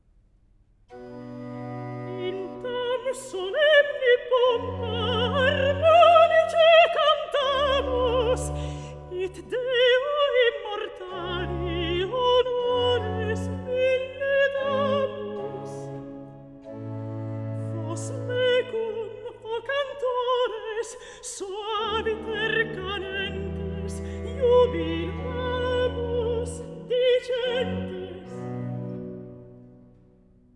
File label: Recitativo; Gloria Rv588; In Tan Solemni Pompa; Antonio Vivaldi (1678 - 1741); Vivaldi - Gloria (2009)